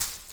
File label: door bamboo open; everness